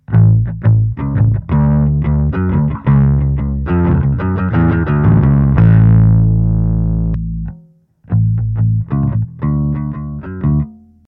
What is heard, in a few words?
overdrive